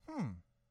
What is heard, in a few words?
good hmm; angband